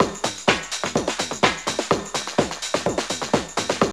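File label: drum loop; X-Static Goldmine 3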